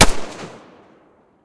usp45 muzzle mono distant

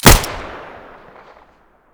muzzle fiveseven stereo, weapons

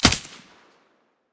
aug silencer stereo, weapons; STALKER; IWP

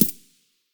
aug silencer mono far, weapons; STALKER; IWP

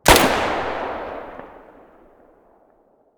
muzzle mono distant, weapons

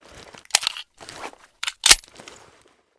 colt reload

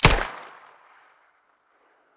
muzzle stereo, weapons; fort

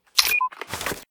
hide, detectors; bear; STALKER; IWP

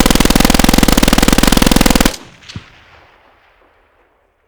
weapon heli; STALKER; IWP

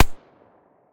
silencer mono distant, weapons

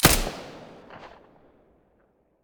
silencer p90 stereo, weapons

